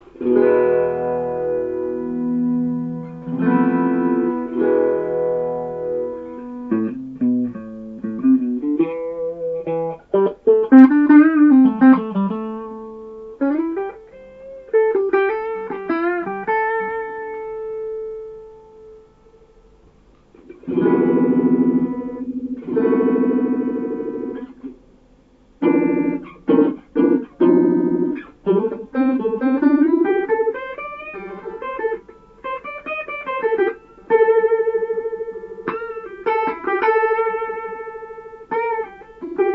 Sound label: MXR 90